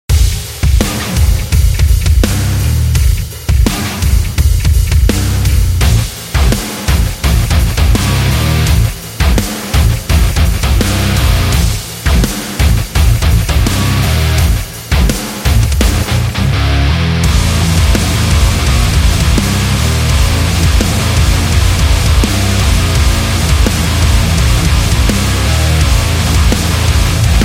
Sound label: Electric Guitar